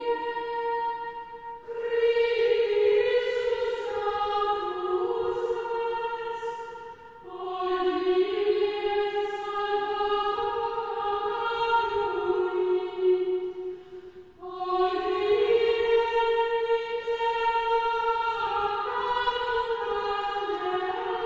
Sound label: Chant Antiphon; Traditional; Chester Processional